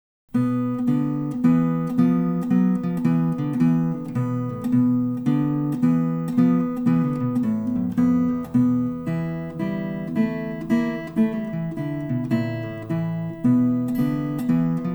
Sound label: ac open string blues